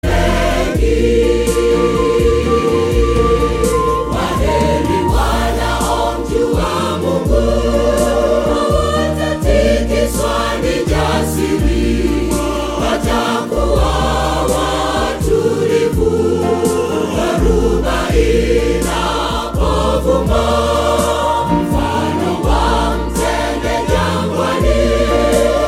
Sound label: Choir; Kurasini SDA Choir